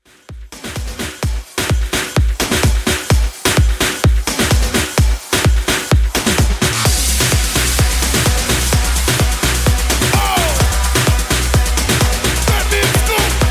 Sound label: Hype Trumpet House 128Bpm